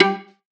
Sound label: tonal oneshot G; Pop; Slate; PASADENA Pop Sample Pack; Tonal One Shots